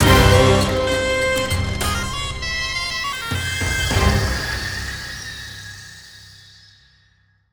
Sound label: victory jingle; Black Reliquary